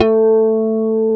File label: GUI HRMFLA, Acoustic, Roland